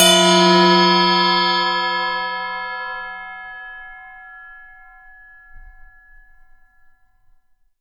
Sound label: Clang